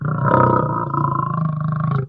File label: ambient; mahlendur